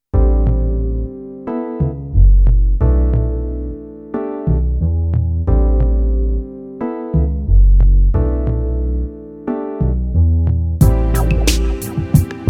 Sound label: blues ballade